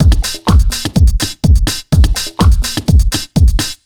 BEAT, Disco House; USB Soundscan vol.20; Fresh Disco House I; AKAI

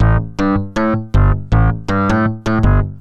Bass, Synth Bass Loops

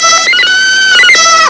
tarzan; Amiga Soundtracker Sample Packs

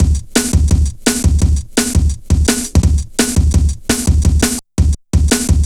Steppa, Jungle, Drum Loops 169.5

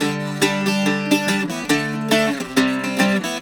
Celtic Flavours; USB Soundscan vol.42; AKAI

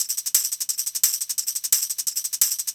D&B Percussion Loop; neuro

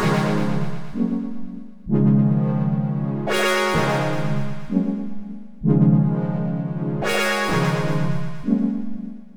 chord breaker; Polyend; Loops n trouble

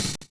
KICKING; Univers Sons - Kitch 70s

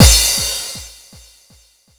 NRG Cymbal

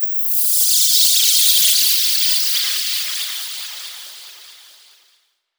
Fall, Hits & Falls; Zero-G Trance Inducer

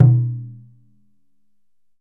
DJUN DJUN, African Perc

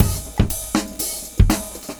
LOOP, 70's Breakbeats; USB Soundscan vol.46; AKAI

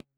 THROAT; Spectrasonics; Metamorphosis; THROATERS

